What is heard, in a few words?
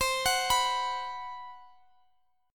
B 5th C, chord; B5/C; arpeggiated; JGuitar